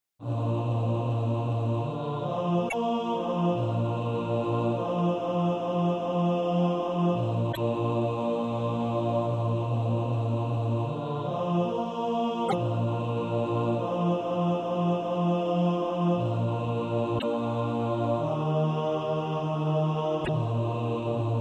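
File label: bass, SATB Practice Music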